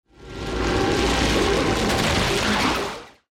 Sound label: plant sounds; Santa Marta